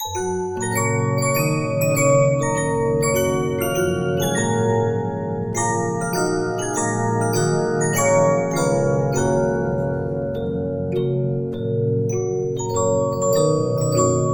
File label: Regina, 15inch disc musicbox